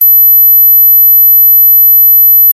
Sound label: mosquito 12khz